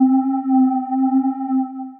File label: Deepsquare, Synth Pad; Deepsquare Fetty; Ableton; Factory Packs; Beat Tools